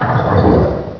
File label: ichy alert